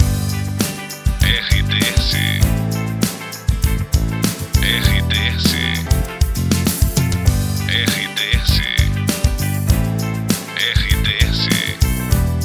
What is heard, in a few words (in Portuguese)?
TRILHA PARA LOCUCAO POPULAR